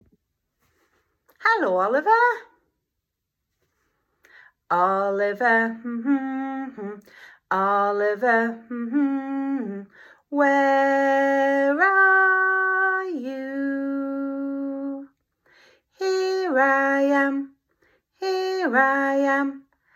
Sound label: morning song